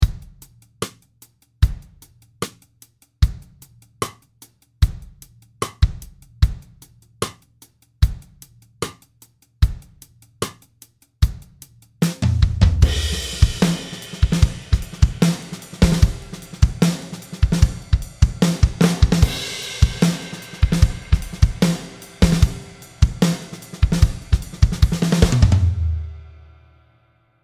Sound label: Atomic Reverb Ambience Snare Reverb; Molecular bytes